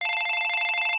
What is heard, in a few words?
phone ringtone; NS Studios - ultra sound collection